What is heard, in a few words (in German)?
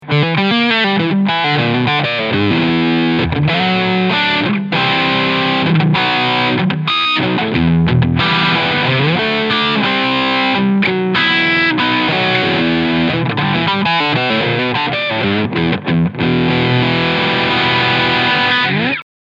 Overdrive Steg PU; Chapman Guitars ML3 Pro Trad Gold Metallic